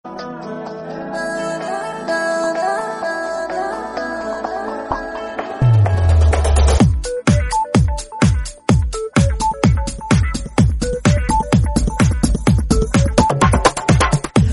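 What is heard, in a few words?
background music Telugu Ringtone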